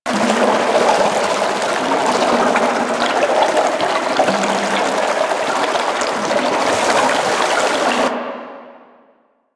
Aqueous Discs Loop; Warframe; Yareli

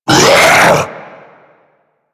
Infested Diseased Ancient Pain; Warframe

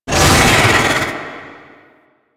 Attack Vocals; Ambience; Golden Maw; Warframe; The War Within

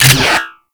Reload, Weapons; Warframe; Infested Tentacluster

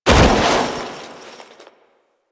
Foley Roll; Warframe; Kahl

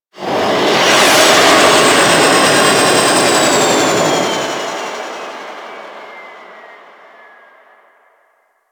Sector Wars Dist Liset Fly By; Warframe; Tenno

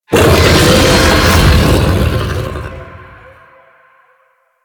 Ability Warning Scream C; Enemies; Vocals; Warframe; The New War; Archon; Amar

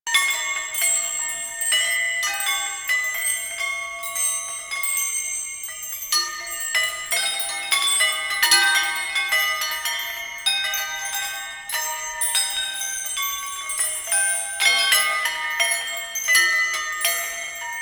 golden carriage loop; Ambience; Props; Warframe; Duviri

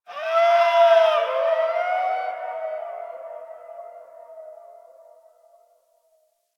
Distant Zone One Creature; Warframe; Ambience; Eidolon Plains; One Offs

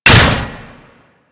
Mace Impact Metal

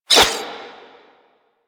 Rising Blades Whoosh C; Warframe; Warframes; Pax Duviricus

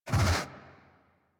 idle alert snort, Kubrow; Enemies; Warframe; Tame

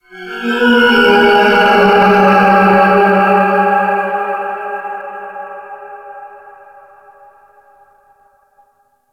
Space Exterior Dist Call; Ambience; Space Battles; Warframe

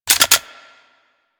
Cannon Fire Mode Switch Rocket; Grineer

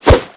Brawl Whoosh D; Warframe; Brawling

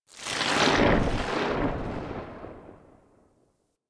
Sentient Start Move, Enemies; Sentient Movement

